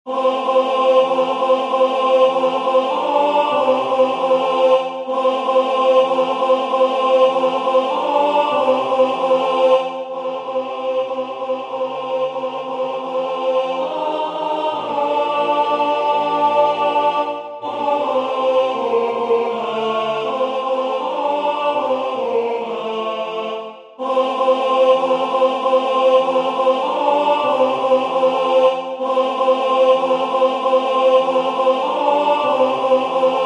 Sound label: tenor; Venez mes enfants